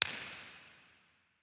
Auditorium, Theatres

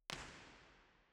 First IR Sala 2800 Genelec Center Binaural; Santa Cecilia Roma; Measurements 2005; Soundfield

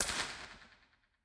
Scala Surround Right Back; IR Scala; Mono

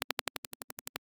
IR, Underwater, Pool; B-format; WASS Livorno

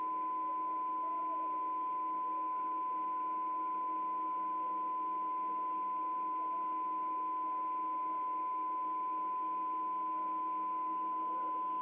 Calibr Ch3 fronte Left; LaSpezia; CESI

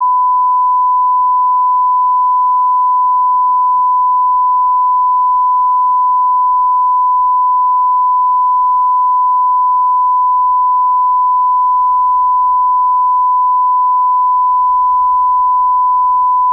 Calibration 94dB

